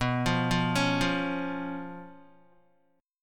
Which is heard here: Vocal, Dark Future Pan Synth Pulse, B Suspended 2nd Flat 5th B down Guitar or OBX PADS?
B Suspended 2nd Flat 5th B down Guitar